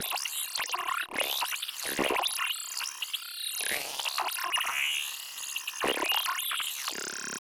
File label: Alien fx; TRUTH-HZ; Crowdsourced Sample Pack; Digital FX